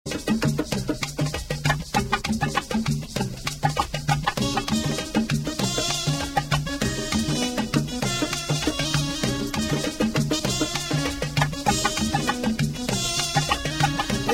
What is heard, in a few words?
Didi drums